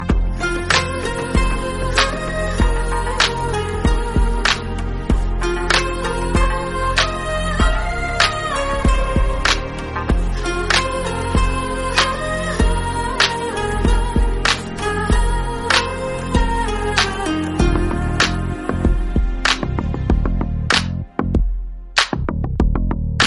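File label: instrumental, ringtone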